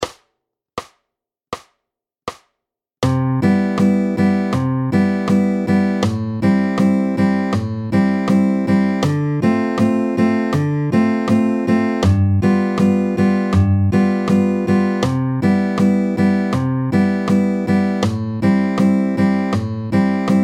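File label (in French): tempo 80 anatole 7M, Un anatole, tempo 80, accords à 4 notes, guitare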